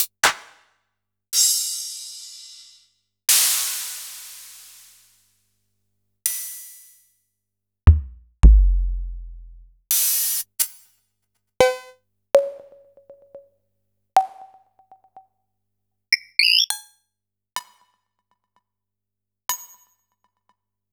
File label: Kurzweil Kit; Sliced